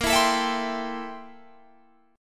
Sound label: A-Minor 7th Bb; Am7/Bb; strummed